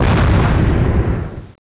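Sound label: EXPLOSION MEDIUM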